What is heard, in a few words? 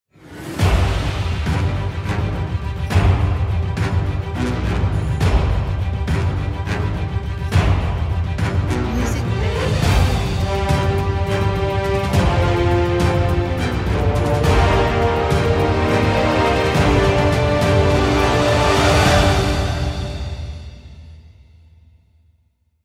Cinematic Intro Logo